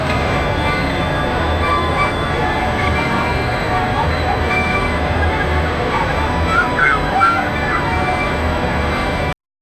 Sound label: distant fairground ambien